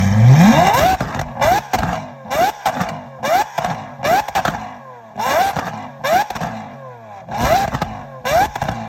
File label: Lamborghini Aventador